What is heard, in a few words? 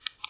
flashlight; quake2; coop